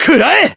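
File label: Voice attack, Japanese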